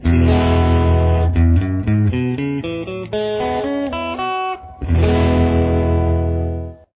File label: e style open, guitar